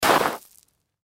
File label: Impact Gravel Scrape Dirt Scuff; Rocks; Sound Effects:Materials; Blastwave